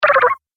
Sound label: Arcade Game Short Slow Down Beeps; Retro Inspired Sound Effects - Think Nintendo, Atari, C64, Etc.; Andrew Fleming